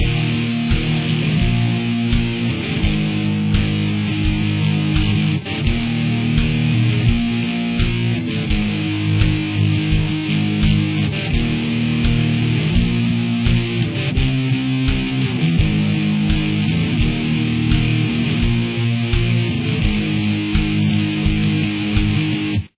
rhythm

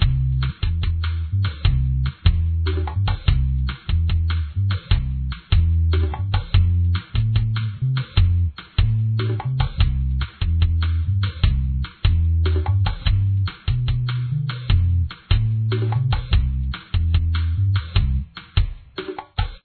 backing

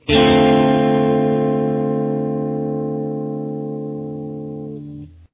D minor 7th

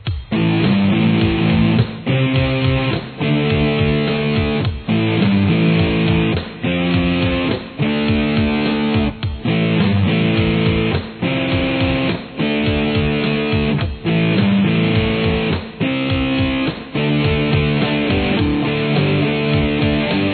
chords

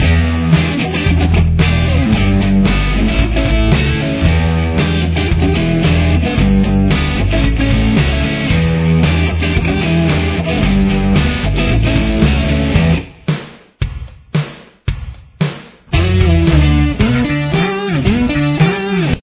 verse mix

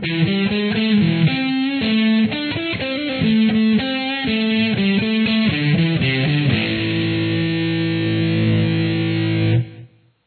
guitar

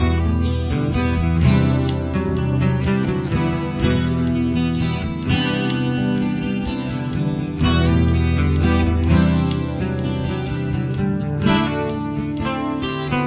solo

